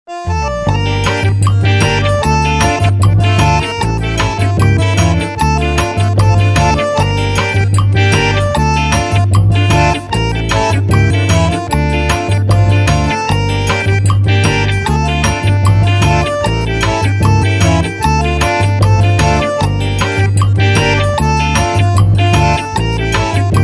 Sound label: Forro; LG; Sunflower